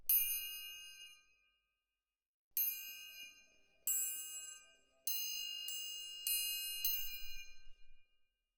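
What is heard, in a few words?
Percussion, Jazz for piano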